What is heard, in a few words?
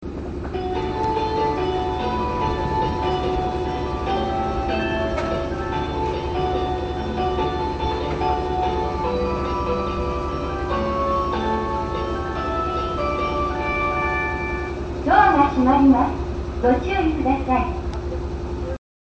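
omika, departure bell; zyoban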